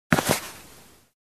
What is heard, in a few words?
Box of clothes slam